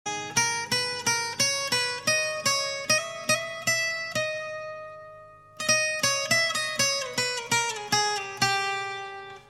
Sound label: Guitar Alarm Tone